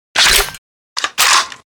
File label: reload; westar